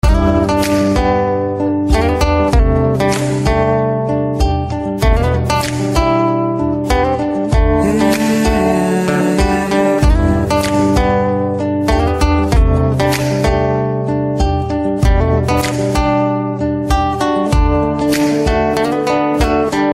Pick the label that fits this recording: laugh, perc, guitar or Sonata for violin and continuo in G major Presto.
guitar